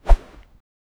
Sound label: soft sandy sound of a, golf